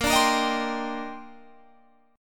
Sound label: D sharp Major 13th, A sharp, guitar chord